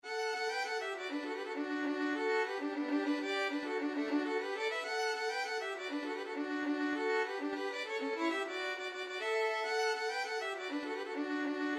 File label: st annes reel, violin duet